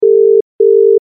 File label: phone beep